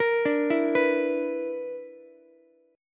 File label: B-Minor Major 7th Double Flat 5th B down Ukulele; BmM7bb5 arpeggiated; JGuitar